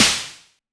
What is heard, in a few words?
Snare, Dubstep One-Shot Drums